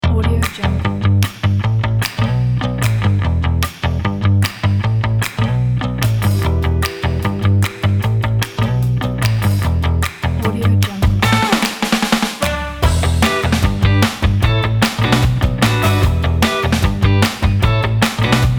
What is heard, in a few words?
funk music kit